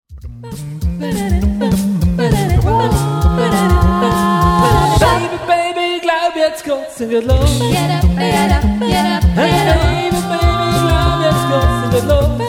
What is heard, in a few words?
Jive